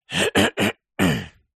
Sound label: human male 38 years old clear throat